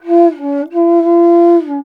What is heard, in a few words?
INDIA BASS, IND BASS